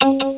Main buttons

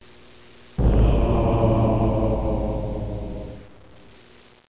spooky; Broderbund Print Shop Multimedia Organizer Deluxe Edition

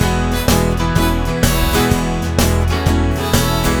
POP-BLUES; GROOVES

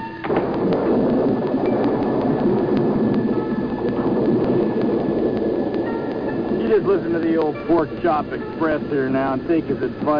storm

